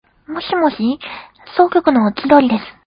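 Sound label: guitar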